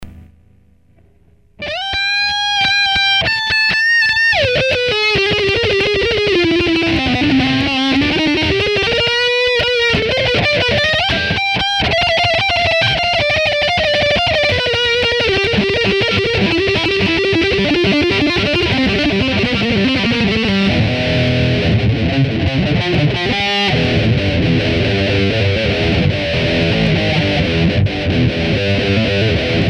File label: solo riff